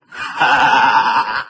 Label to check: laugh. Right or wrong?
Right